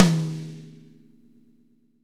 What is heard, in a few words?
V-Pop Tom 50, Roland BK-5 Toms